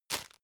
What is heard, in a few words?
tissue grab hard; objekte; taschentuch